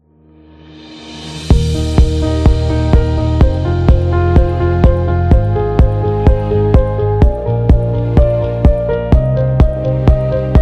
Corporate Upbeat Promo

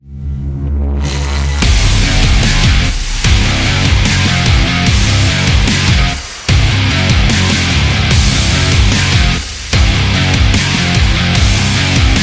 A Hard Rock

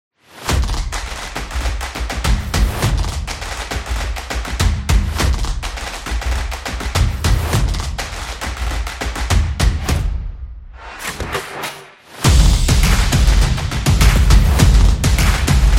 Drum Energetic

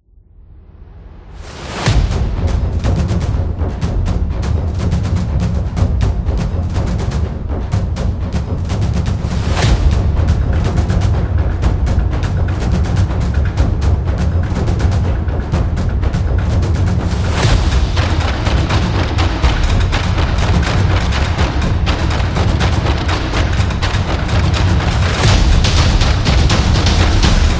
Japanese Drums

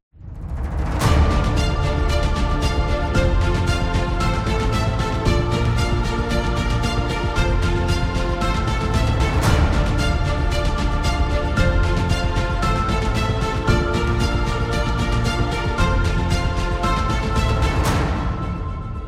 Cinematic Inspiration

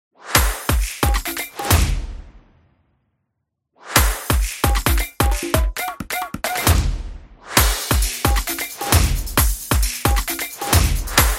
Hand Percussion

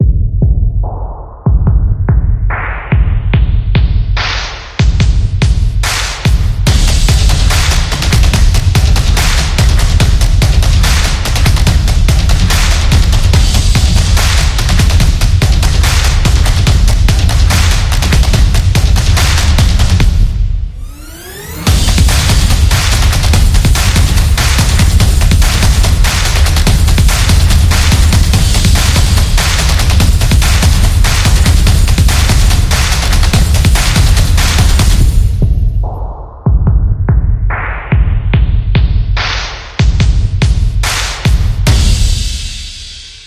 Drums Action Stomps; high energy

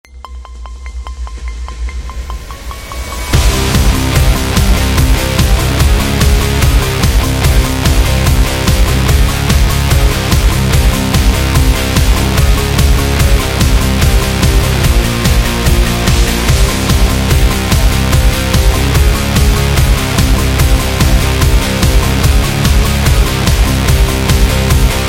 Industrial Metal

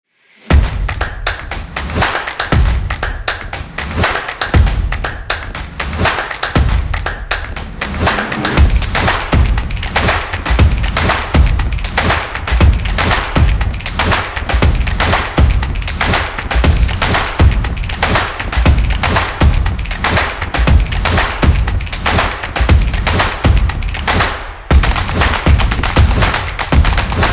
Drums Intro

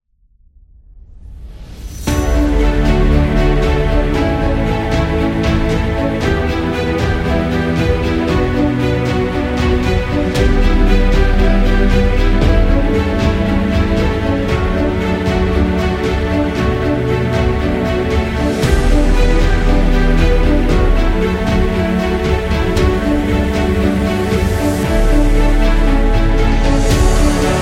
Epic Positive; epic music vol 2

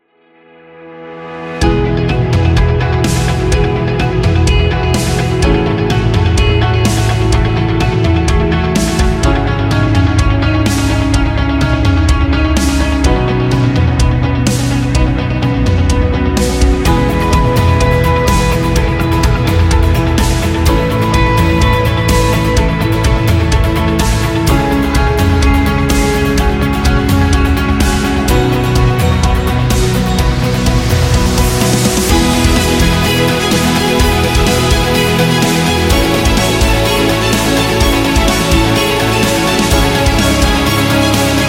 Energetic Corporate

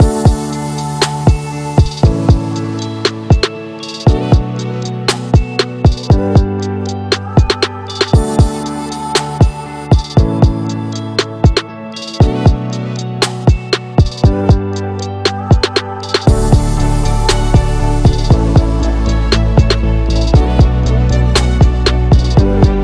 Health Corporate

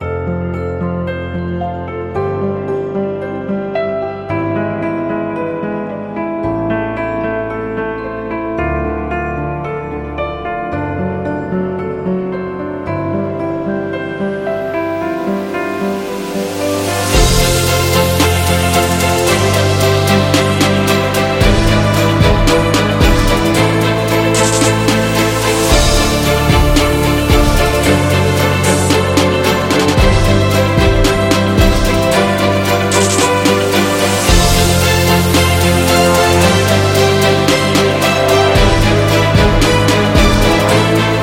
Luxury Majestic; beauty vlog

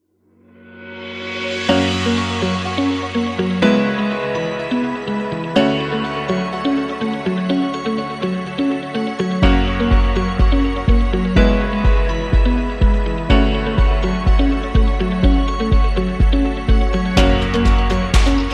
Corporate Business Presentation

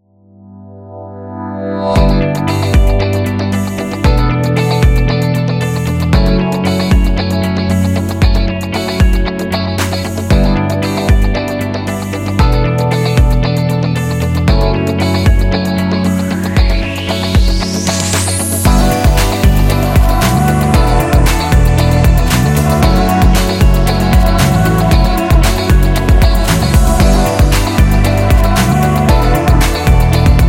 Dream Pop, corporate music; corporate music vol 18

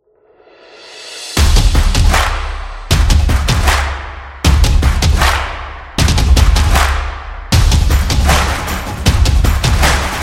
Beat Bass

